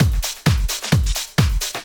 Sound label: drum 130 trance